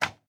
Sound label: bow shoot; Minetest; VoxeLibre